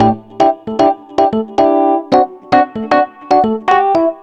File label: Electric Piano, Loops, Keys